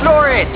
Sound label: car horn